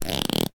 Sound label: household comb teeth scrape